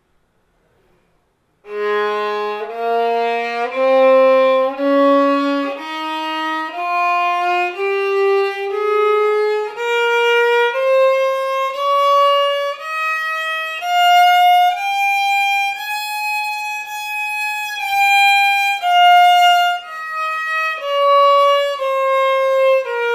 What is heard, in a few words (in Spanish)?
la b escala, violin; mi primer libro de escalas